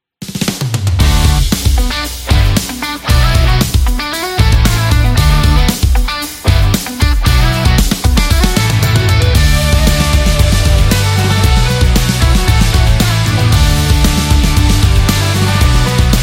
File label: Rock Intro